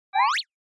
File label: Motion Pop